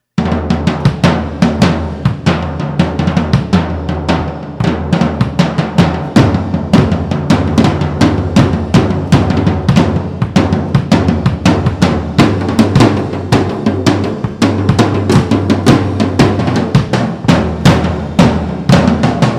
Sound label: Drum Solo; School Of Rhythm